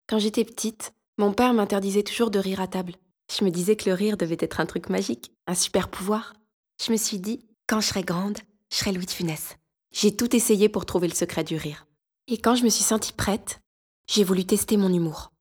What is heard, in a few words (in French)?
Le rire